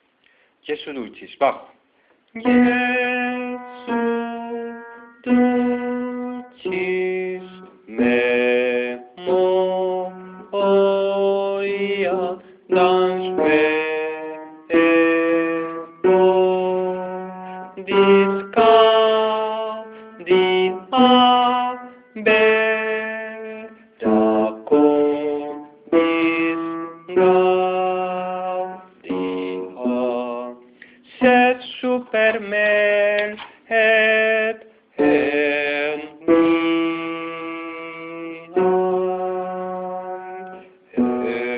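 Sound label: bass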